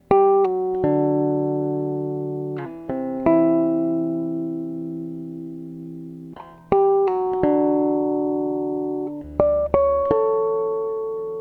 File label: Jazz bridge harmonics